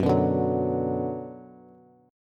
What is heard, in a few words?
Gb Suspended 4th Sharp 5th, Bass; strummed; JGuitar